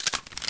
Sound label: g3sg1 slide